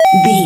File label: retro collect pickup coin